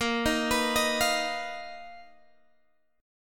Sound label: B Major 7th Flat 5th A sharp